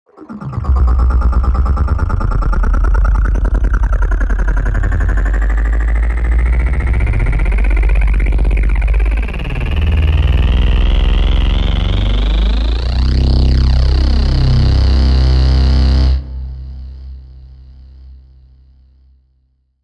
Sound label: Space Car, Acceleration of space vehicle.; Space Buggy; Spacecrafts